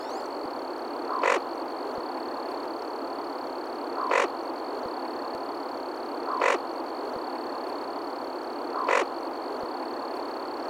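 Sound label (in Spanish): pato boludo menor, reclamo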